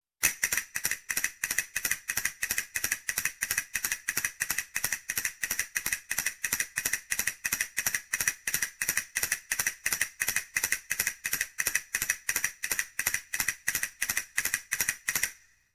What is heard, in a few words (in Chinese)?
欢乐搞笑